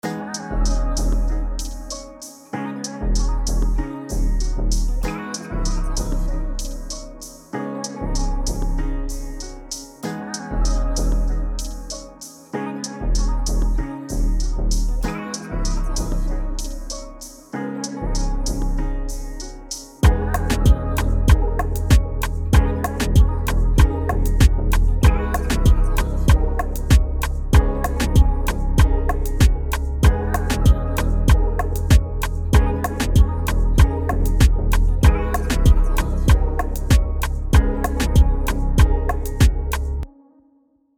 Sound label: Afro Melody Loop Gmin 96 BPM